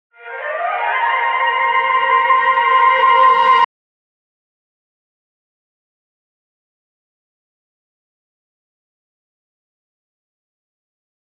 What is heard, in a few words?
ORCHESTRA